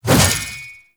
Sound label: Shield Whoosh; Warframe; Duviri; Dax; Mace And Shield